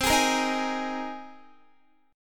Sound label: C Minor 7th Sharp 5th C Guitar; Cm7#5 strummed